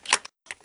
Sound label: Pump Shotgun Reload; Shooter